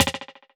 Perc Hit Rattle; Drums; Misc Percussion

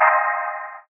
Stab Telephone Fmin7; One Shots; Ableton Live 12 Lite; Core Library

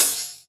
Hihat Open

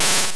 Zap; MMBNMMOP

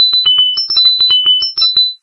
twinkles; There; ThereClient

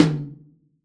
Tom; Ableton Live 12 Lite; Core Library; Drums

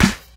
Snare Clap, drums; One Shots; Back

